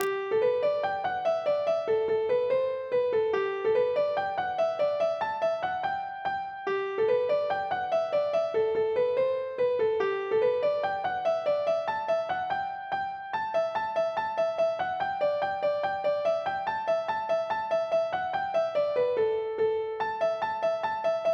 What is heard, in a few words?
polka; boeuf trad